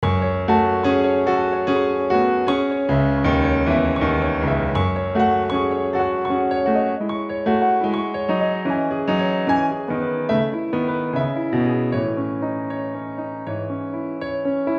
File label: soprano 2